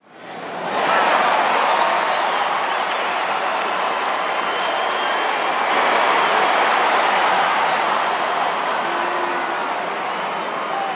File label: Baseball Crowd Reaction; Crowds